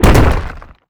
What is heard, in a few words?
rock impact spike trap